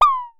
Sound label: Synare Filter; Star Instruments Synare 3